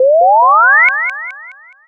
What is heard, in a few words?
scifi